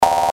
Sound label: alarm